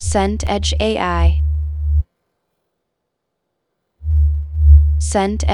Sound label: volcano rumble low